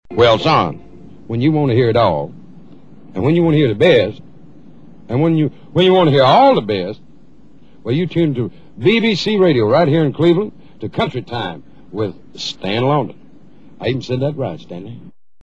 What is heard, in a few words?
jingle